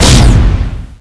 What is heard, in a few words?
linkgun light start; weapons; cstrike